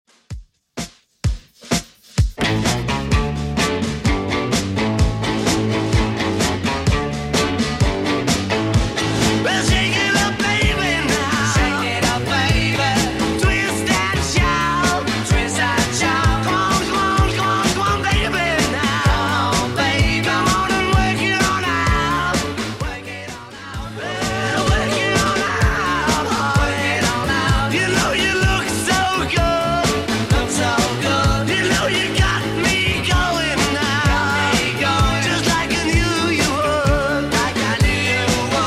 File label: 60s Redrum 128pm